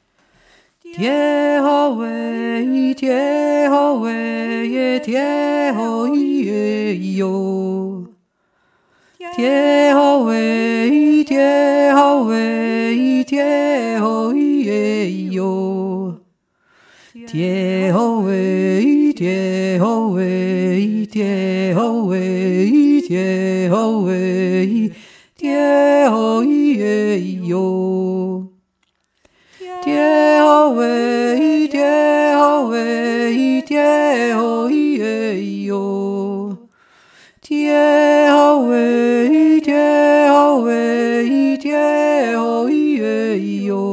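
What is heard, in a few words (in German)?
hirten jodelr, jodeln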